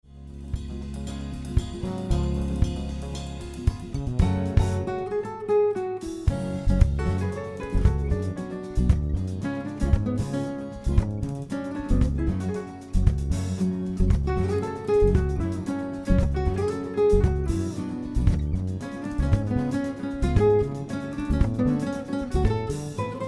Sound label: Rhumba